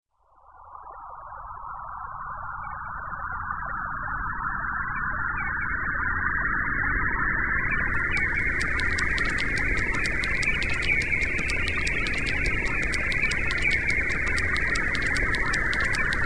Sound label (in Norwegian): Knasing og fugler